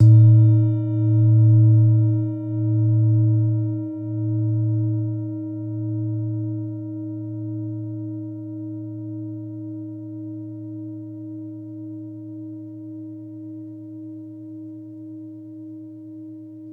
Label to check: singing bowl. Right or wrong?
Right